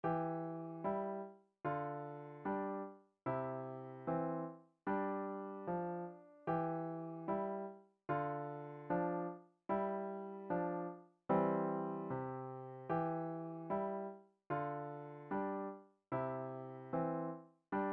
Two Note Phrase, piano

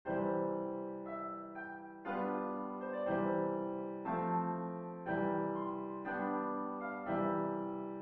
mozart sonata in c with chords, piano

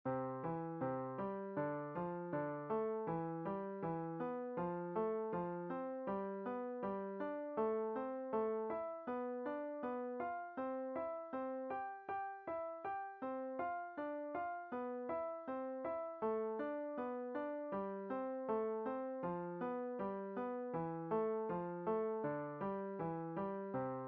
Five finger skip, piano exercises

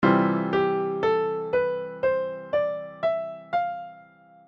F major C chord

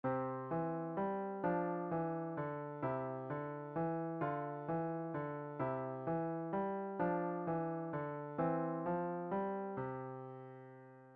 Coordination, piano exercises

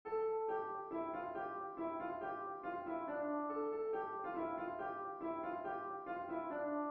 play piano by ear; Kalinka

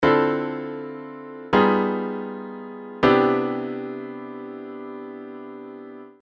II V I G7b9b13 2nd inversion, jazz piano chords

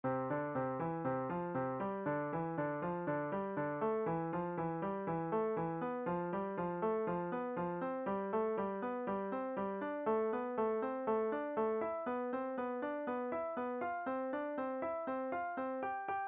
Five finger Intervals mixing, piano

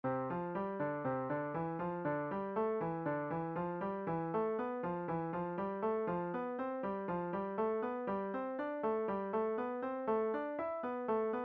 Five finger Intervals Fourth, piano

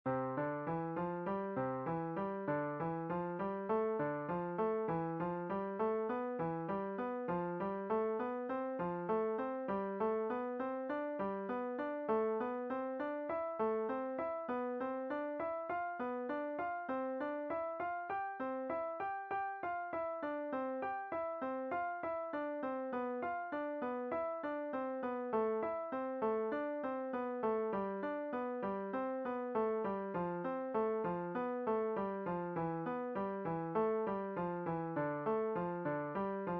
Five finger step & skip, piano exercises

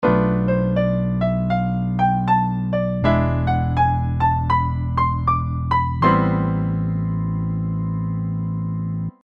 II V I locrian

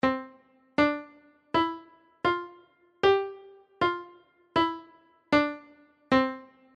staccatissimo

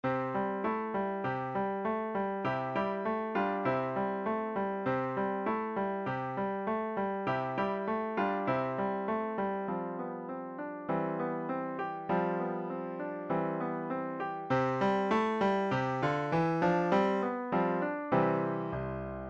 Crossing 2 over 1 Boogie, piano

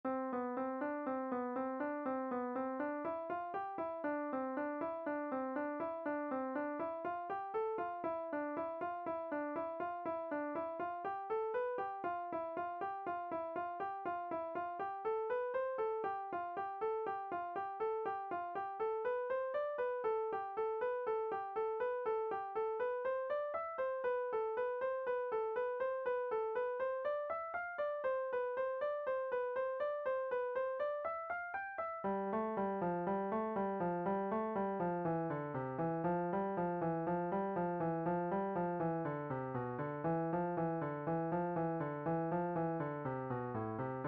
Crossing 2 over 1, piano

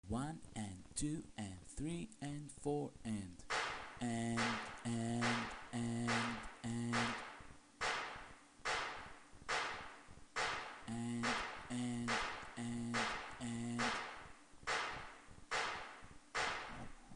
eigth notes clapping

